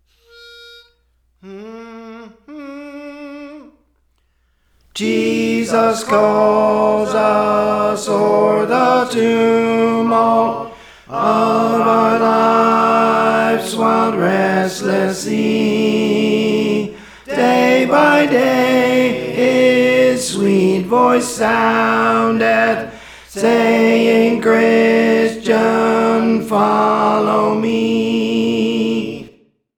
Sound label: Tenor; Praise For The Lord Training; Jesus Calls Us